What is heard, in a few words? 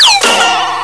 Bayoop